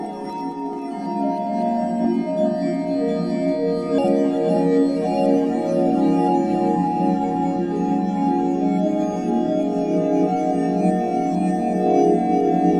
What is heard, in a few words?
IDM Chord